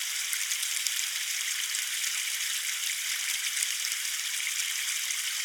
Wet Floor; Boomerang Fu

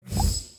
Launch Spell; prodigy math game

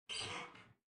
Creak; Lethal Company

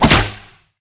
fire crossbow

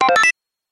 Start Game; Golden Force; PS4 PS5 Switch Windows Xbox One

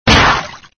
SFX sound (fish hit)

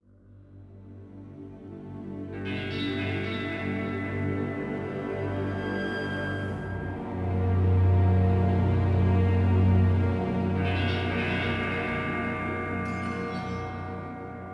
Spooky; Arizona

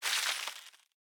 Ice Freeze; Boomerang Fu

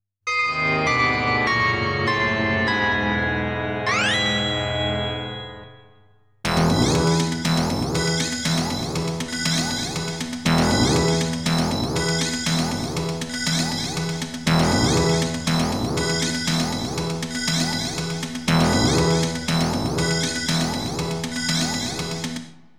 Game Over ~ Continue; Dragon Breed

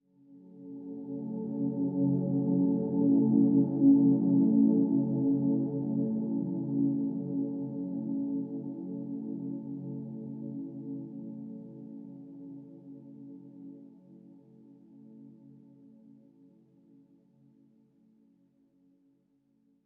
Swell Tone; HDD Theme Scull Swell Tone 04b; inside

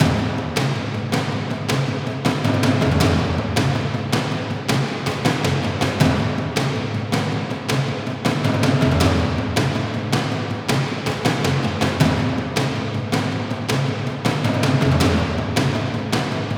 Battle Warrior Drums Loop; rambo the video game 2014

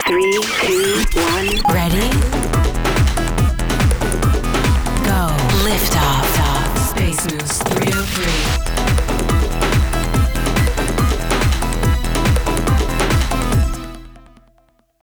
Radio Electro Jingle; Gangstar West Coast Hustle; android

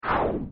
Meld; yet another pixel dungeon android

